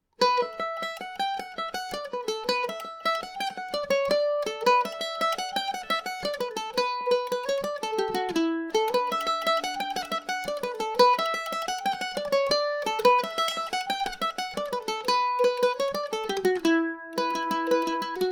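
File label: ingonish jig E dorian, mandolin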